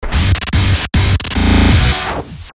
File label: Stabs & Bursts; Best Of N2O Library; Pear